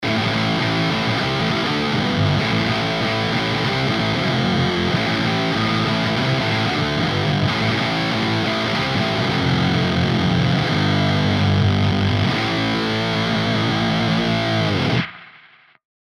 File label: Ducked Fuzz; Gojira Plug-In Suite